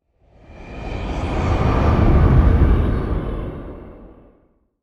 Spider Cave Creepy Sweep; ambience avant gardens

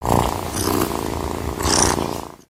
Stretch, creatures organic

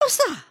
curtsey, emotes

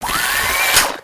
Spider Boss Fire Rain; creatures mechanical

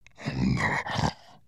VO sleep, creatures organic

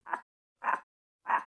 Pets Skunk Idle Bored; creatures pets; Lego

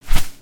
Seagull Wing; creatures organic

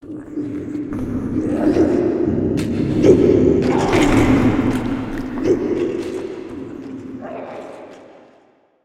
Cave Ambience; Skullken; ambience ninjago

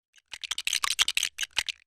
enter brick mode; build mode

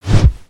jet pack sweetener; emotes

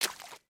Foot Swamp Water Run; footsteps

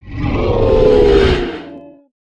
howl, creatures organic

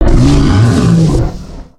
Dragon Idle; creatures organic

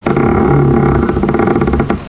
Jail Cage Creak; ambience gnarled forest

